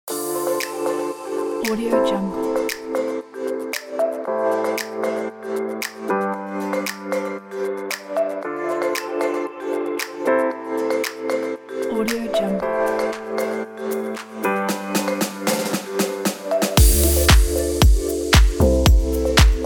chill dance

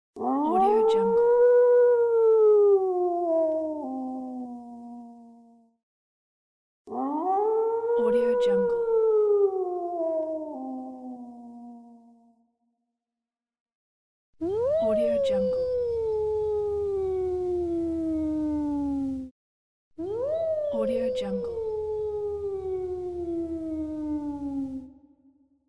wolf howling

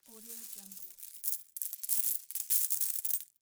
coin pile shuffle

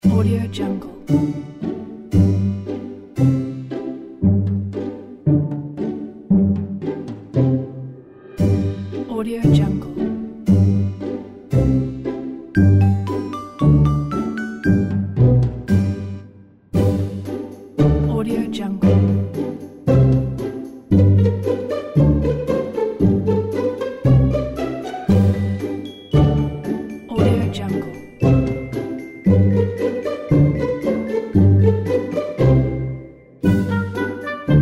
pizzicato music for summer adventure

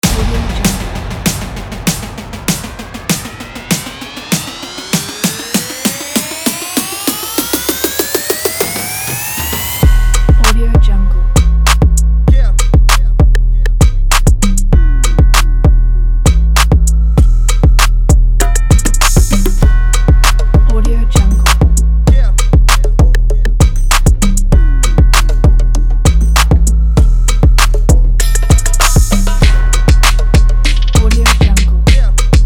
a rap

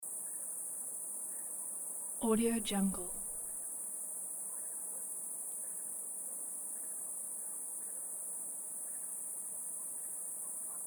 cicadas and frogs ambiance

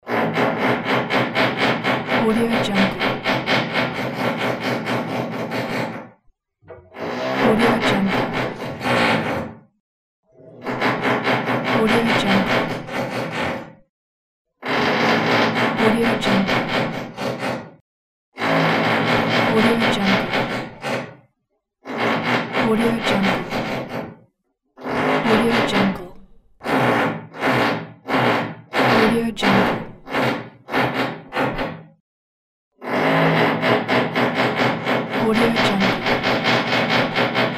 noisy neighbors are drilling walls

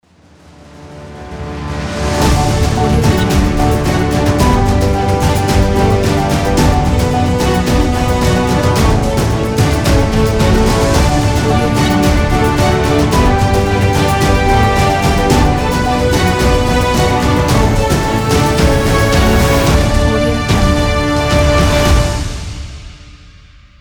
epic news awards logo

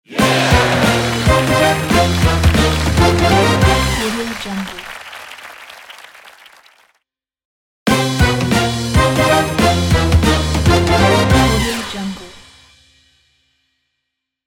game show fanfare

